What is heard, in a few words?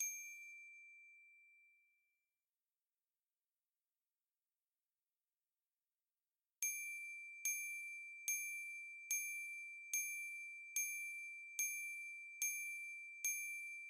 glockenspiel; fara main theme